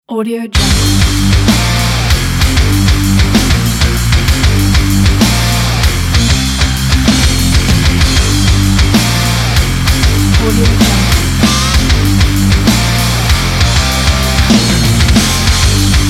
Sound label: a metal loop